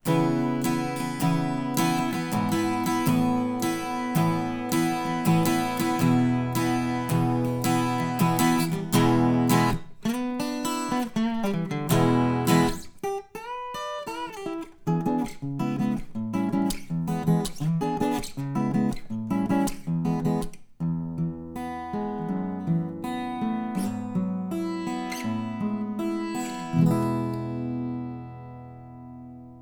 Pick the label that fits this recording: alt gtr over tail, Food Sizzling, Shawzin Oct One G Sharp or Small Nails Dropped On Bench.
alt gtr over tail